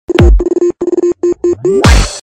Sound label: Mixed Sound Effects